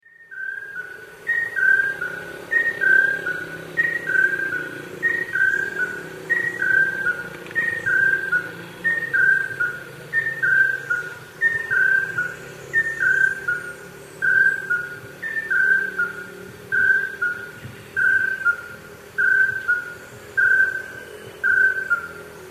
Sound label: Anurolimnas castaneiceps, song; S. America; Eastern Lowlands